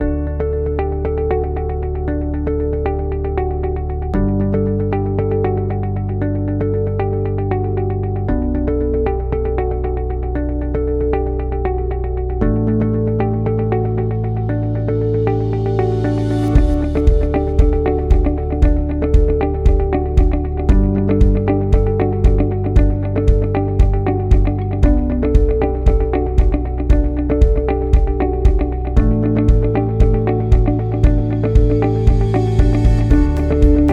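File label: inspirational corporate business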